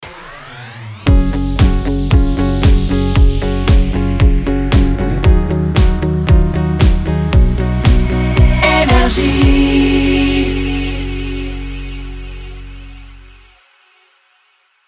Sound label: Jingle Ramp; NRJ